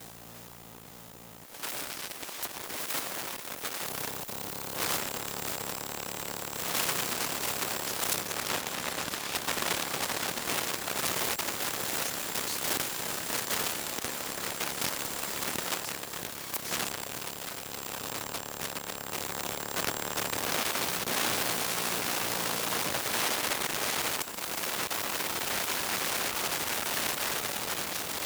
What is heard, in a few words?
Electromagnetic Macbook Sequence; Mono; Essentials Series NOX SOUND